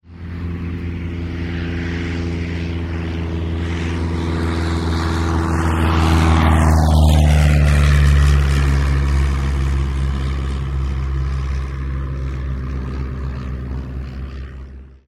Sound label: piper cub, Aircraft sound record